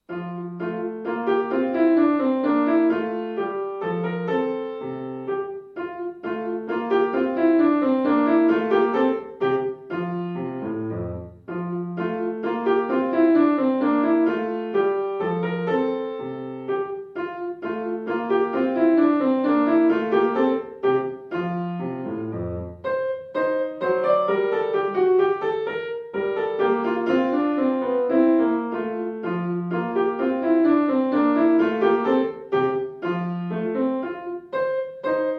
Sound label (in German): Menuett F-Dur; Neefe